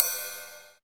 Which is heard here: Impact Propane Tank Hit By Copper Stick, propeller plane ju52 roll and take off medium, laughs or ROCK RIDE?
ROCK RIDE